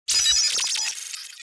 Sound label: mecha fault